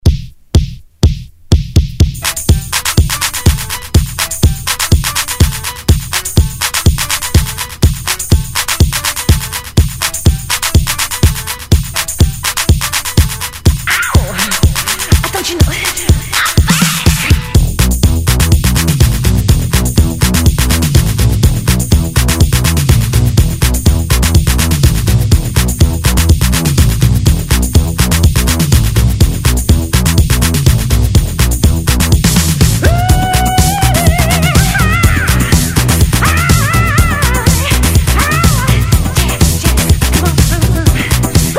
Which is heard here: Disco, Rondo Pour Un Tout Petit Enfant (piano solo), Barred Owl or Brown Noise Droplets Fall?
Disco